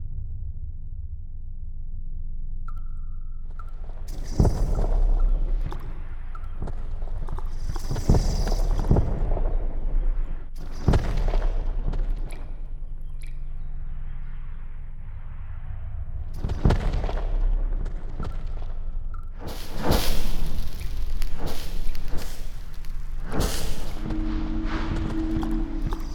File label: Binaural